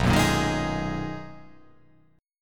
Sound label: B Major 13th B down